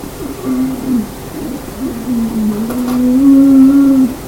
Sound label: bear cub